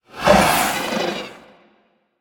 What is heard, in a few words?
idle breath; smite; ahpuch skin10